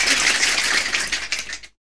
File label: fast, mouth klacks; Roland LCDP14 Africa VOL-2; Foot & Mouth